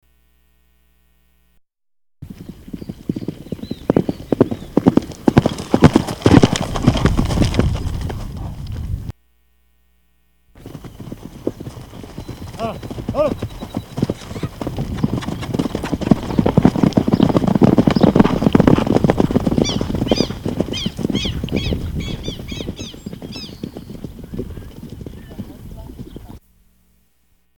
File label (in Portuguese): Amb Cavalo Galope Chegando